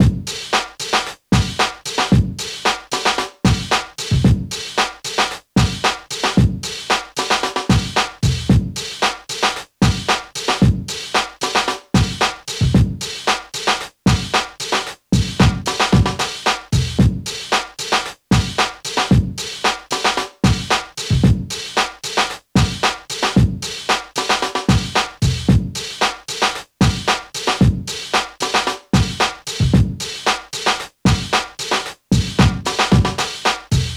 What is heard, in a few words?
113 BPM HYPE ABUNDANCE LOOP drum; drumprovider rmxloop pack merc